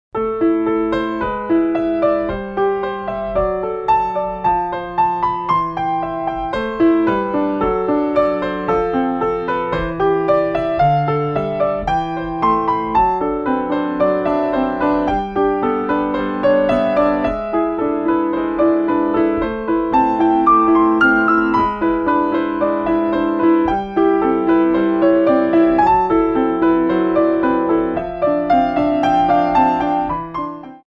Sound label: classical